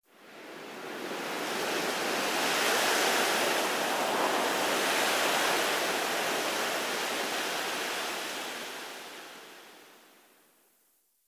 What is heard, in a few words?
wind leafy whistle; amb elements; modern warfare 2